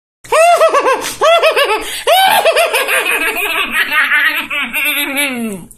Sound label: Clown Cackle